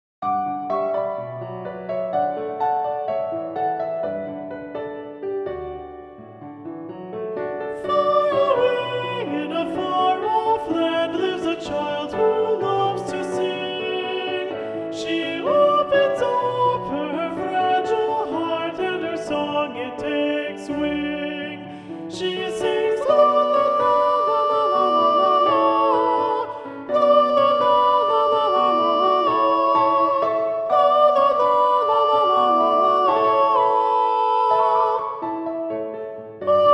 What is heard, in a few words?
2 Part Balanced Voices; sc elementary honor choir